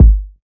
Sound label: kick drum sample G sharp key